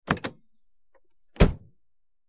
Lincoln Towncar Door

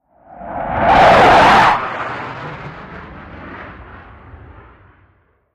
Fighter Jet Hot Flyby; fighter jets

